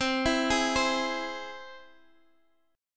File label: C Augmented down Guitar Half Step Down